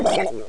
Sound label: gurp; openffa